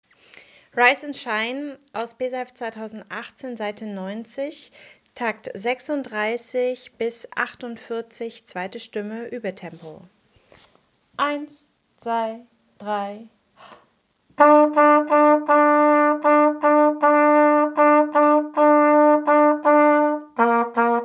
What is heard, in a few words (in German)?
Rise and shine Stimme 2, Posaunenchor; Takt 36 bis 48; Uebetempo